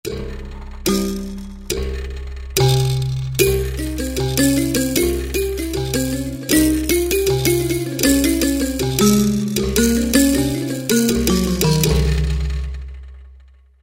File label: Buzzards, Roland V-Synth; Factory Patches